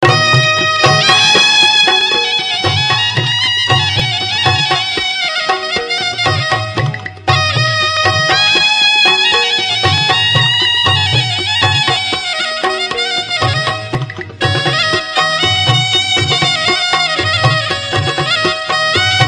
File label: Bhojpuri Music